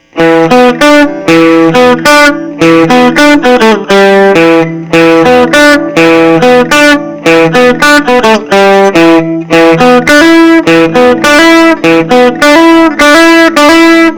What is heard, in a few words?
Clean Treble; JHS Muffuletta; Stoner Rock; guitar riffs